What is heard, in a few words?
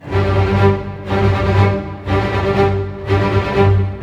SNAR, Snare Army; East West - Scoring Tools